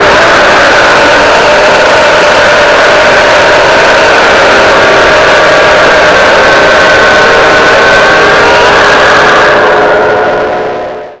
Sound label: jumpscare sound; five nights at kazgrins